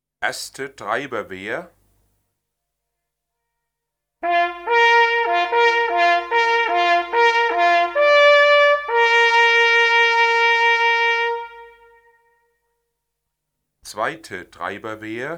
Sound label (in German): Treiberwehren, jachthoorn